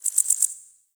Cricket; Warframe; The New War; Ambience; Zariman

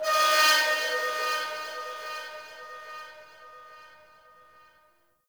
Metal Scrap Texture Med, Corpus Ship ambience; Textures

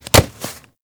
Generic Mantle Foley; Warframe; Characters

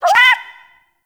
Rabbit Ambient Call; Warframe; Duviri

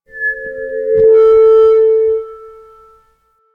Mall Stage Feedback

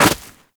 Run Snow, Footsteps; Warframe; Operator

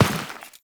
step dirt, footsteps; Warframe; Teshin

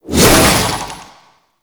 Shield And Sword Charge Throw; Warframe; Grineer; Mortifor

